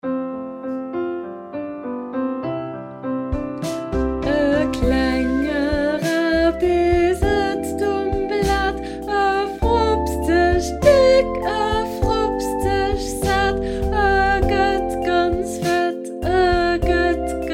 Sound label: Voice